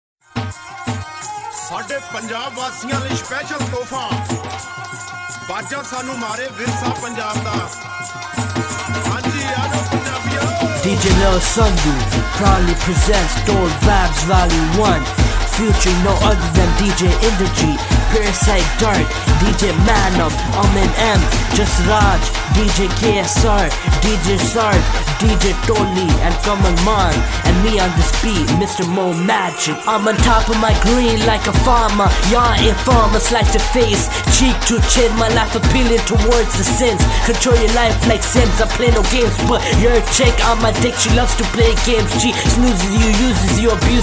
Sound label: Extra Dhol Vibes; UK Punjabi